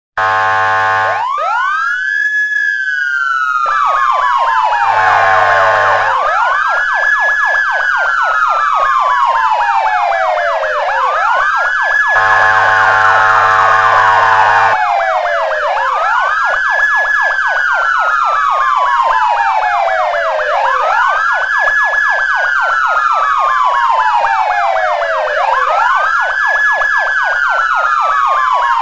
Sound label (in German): Firefigther Bomberos Wecker